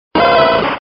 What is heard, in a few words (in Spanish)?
Grito de Growlithe